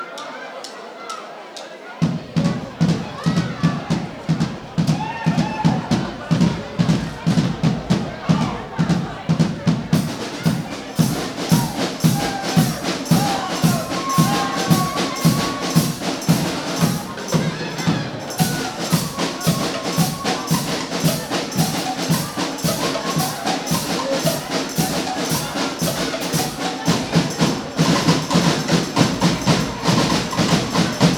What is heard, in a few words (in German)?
Rhythmus